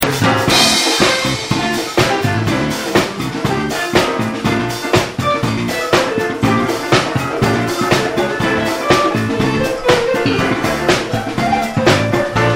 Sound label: slap, bass